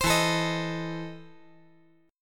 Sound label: E Major 7th Suspended 4th Sharp 5th